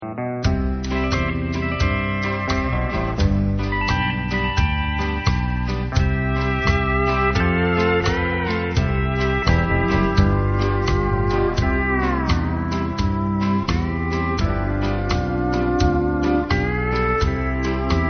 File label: sad song